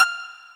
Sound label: Pizzicato; osu